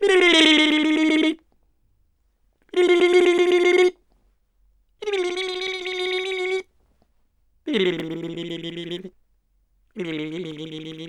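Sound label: Teasing with the tongue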